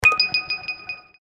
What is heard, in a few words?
ui start game